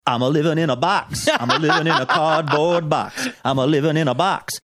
singing

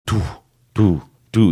making sounds of pulling out napkins one by one

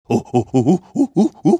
making monkey sounds

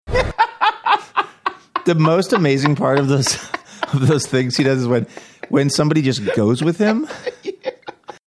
having a laugh

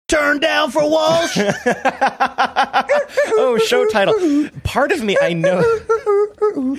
saying turn down for walsh singing funny tune

